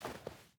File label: footstep hide snow walk; Rust